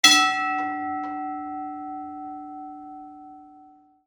BELL BRASS

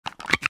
CAMERA SLR

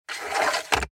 Auto Oil Cap Replace

